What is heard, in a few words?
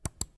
Mouse click; Phasmophobia